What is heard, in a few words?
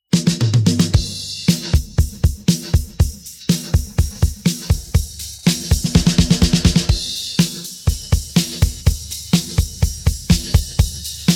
drums pattern